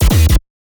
Bass Tech Phat 160 D#; Bass Tech Phat2 160 D#; exciplex sample presets pack; JinoBeats

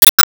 Ball Sub Hit

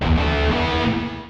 Electric Guitar Hard; Electric Guitar Hard Into Air

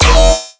Bass Shot Metallic F; Metallic3

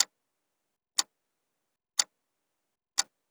Clock

